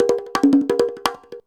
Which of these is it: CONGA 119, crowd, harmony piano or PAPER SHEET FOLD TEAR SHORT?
CONGA 119